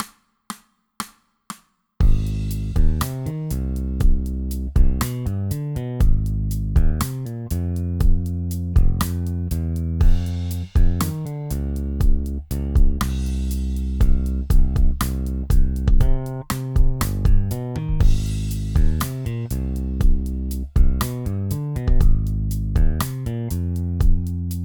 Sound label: Sweet chords Jam track